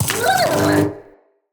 Shock Mote; Motes

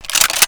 Gunblade Reload; Warframe; Tenno

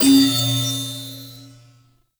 Receive Buff; Warframe; The New War; Narmer; Grineer

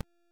Exalted Sniper Cast; Warframe; Frumentarius

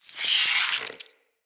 root spider vocal pain; Animals; Spiders; Vocals

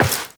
Step Metal, Footsteps, Walk; Warframe; Tenno

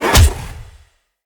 Melee Heavy Attack Slam; Whooshes; Jump Slam

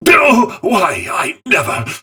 Training Dummy Pain; Warframe; Duviri; Target Dummy

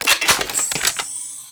Shotgun Fire Mech; Kuva Sobek; Double Barrel Shotgun

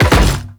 Land Metal, Footsteps; Warframe; The New War; Characters; Kahl

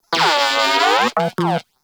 Moa Compassionate Vocal Disagree; Warframe

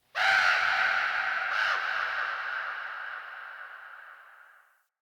Day Bird Of Prey, Ambience

